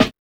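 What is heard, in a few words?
snare drum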